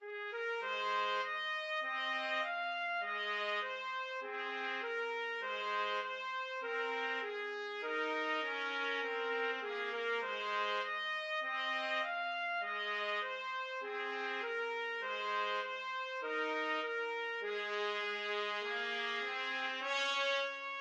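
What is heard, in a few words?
alabama 2 trumpets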